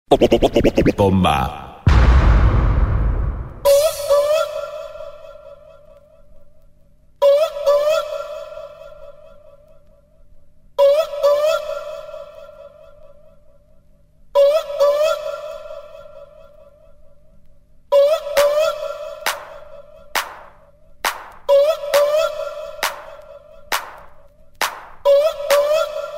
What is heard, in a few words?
bomba acapella